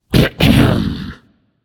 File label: clear throat; smite; xbalanque